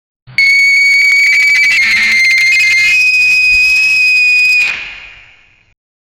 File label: silence, sudden distorted, telephone